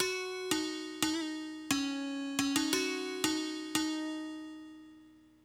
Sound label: Hip Hop and R&B Drumloops